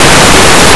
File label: book; Blue Heat